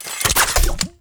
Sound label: Rocket Launcher Reload; UT Weapons